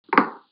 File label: footstep wood